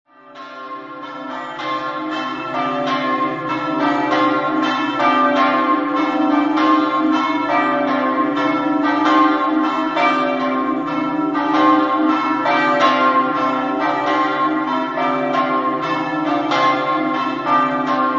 artegna, campane

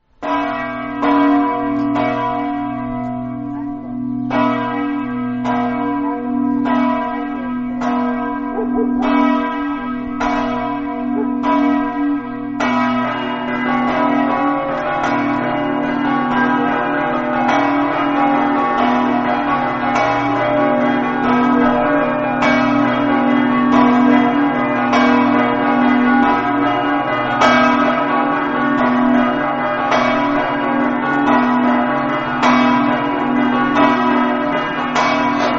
gruaro, campane

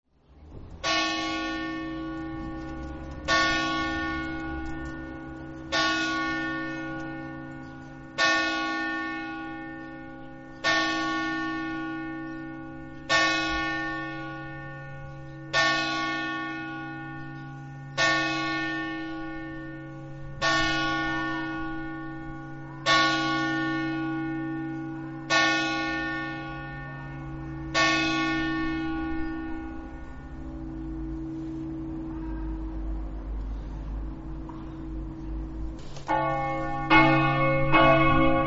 lavariano, campane

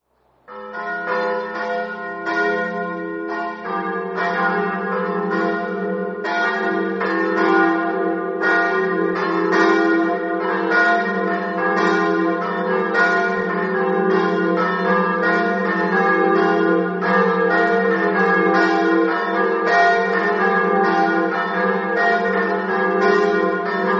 canussio, campane

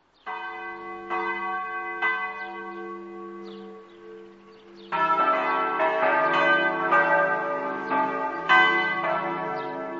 sgiovanni, campane

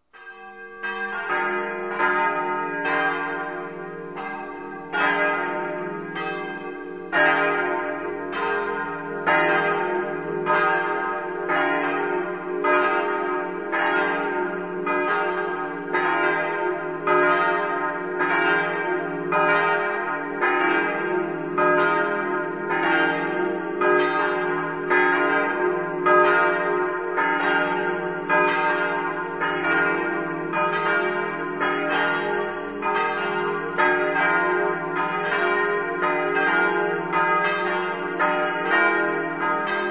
anduins, campane

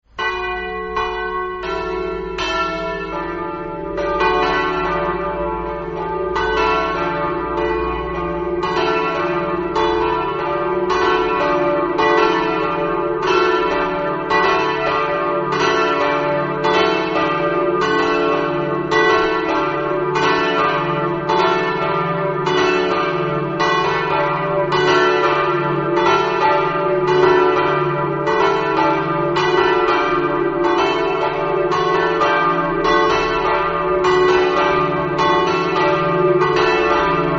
cussignacco, campane

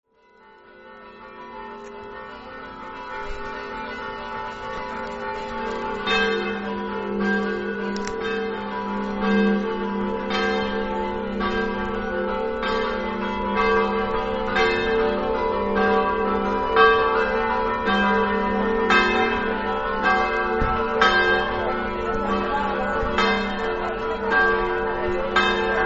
chiasottis, campane

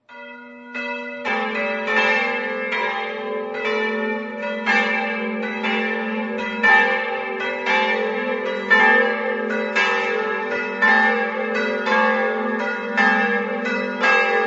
montenars, campane

